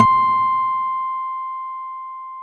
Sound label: AC GUIT C5, AC.GUITAR